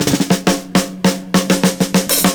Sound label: FILLS, 70_s Breakbeats